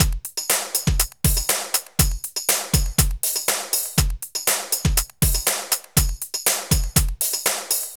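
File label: drum loops, left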